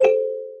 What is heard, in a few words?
plinko neut